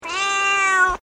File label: Animal Cat Tabby Meow